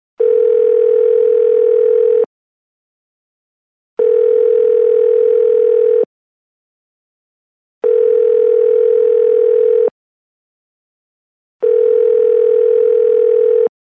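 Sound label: sound of telephone dial tone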